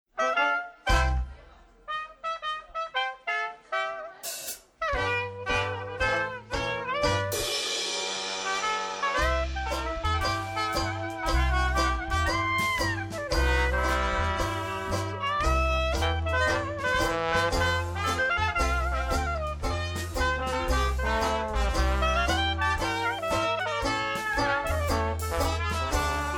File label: chime blues